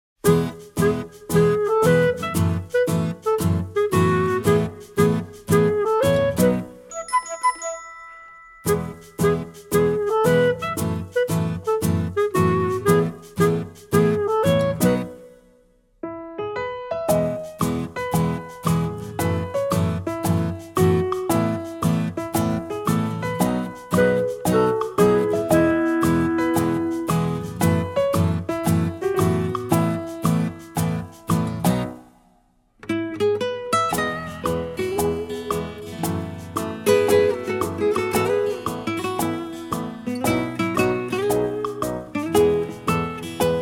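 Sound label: calm light with development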